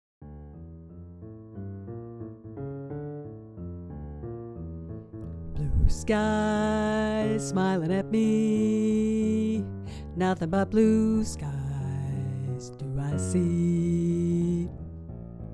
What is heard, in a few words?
Tenor